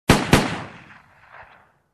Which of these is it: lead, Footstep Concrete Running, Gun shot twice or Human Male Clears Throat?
Gun shot twice